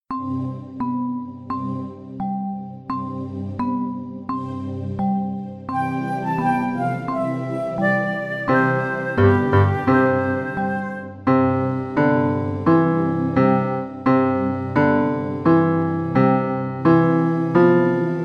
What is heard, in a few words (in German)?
Melodie; 兒歌 – 兩隻老虎